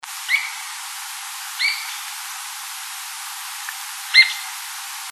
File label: Flycatchers; AZFO; Wolf